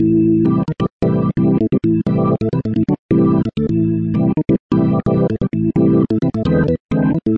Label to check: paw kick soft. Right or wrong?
Wrong